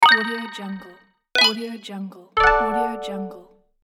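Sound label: game win points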